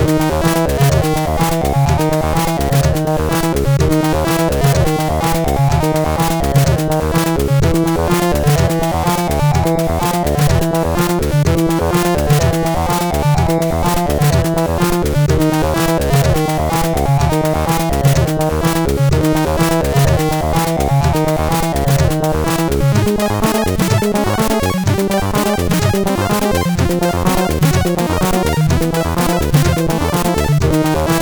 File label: Techno, CSG8580R5; hvsc